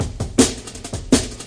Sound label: 163 no kick, drum loops and breaks; 161 to 180 bpm